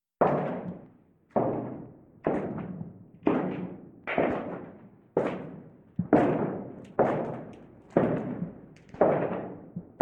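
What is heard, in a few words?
footstep sounds; zvuki shagov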